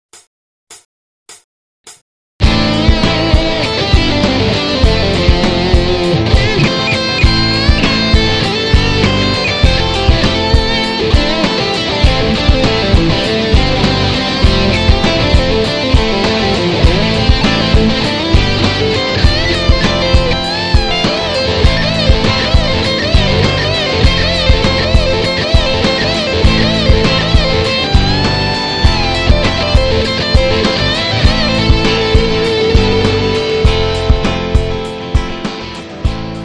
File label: stair solo, guitar